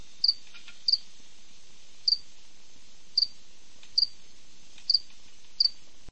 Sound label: Acheta domesticus; Arthropoda